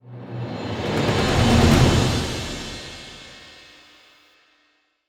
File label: Combat Outro; Kaermorhen